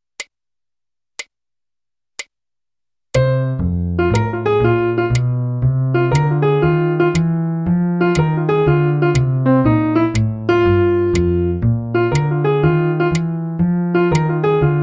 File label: blues, piano